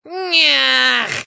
eat incorrect; codeapillar

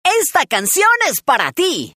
rock; rocktopus

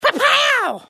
seal; rocktopus

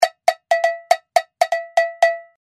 Cowbell Solo; rocktopus

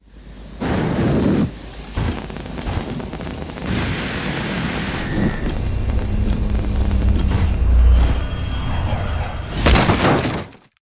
hover pack crash landing

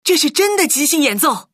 rocktopus sample jazz

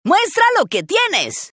jazz; rocktopus

